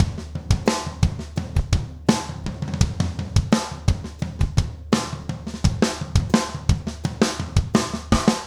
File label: rock 85